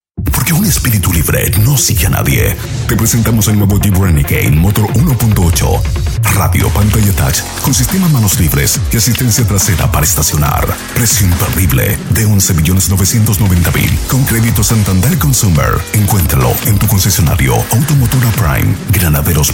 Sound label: ringtone